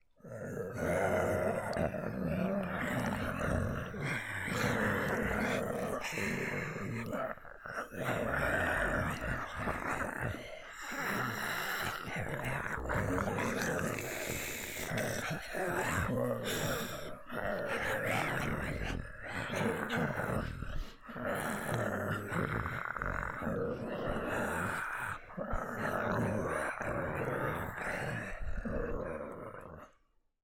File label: Horde Of Dragonkin Or Zombies